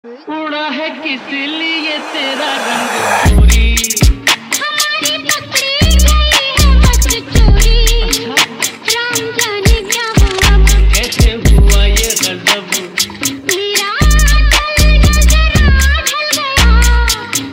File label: Trap Mix